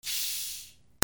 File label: KITCHEN